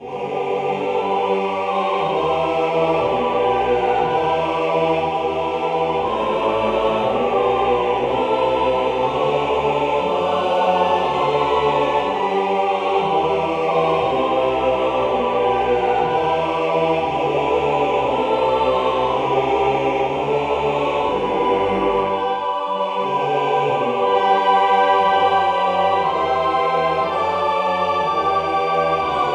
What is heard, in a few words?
ahh choir